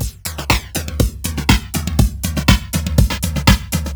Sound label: 121 Full, Drum Loops 121bpm; Back2Basics; Nu Dance Electro